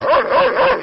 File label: dogattack; Super Trench Attack